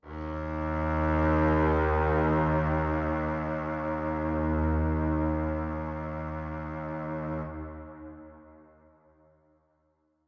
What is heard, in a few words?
Cello Verb D sharp 2, Verby Solo Cello